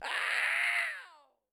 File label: OOOWWW; Yamaha DTXpress-II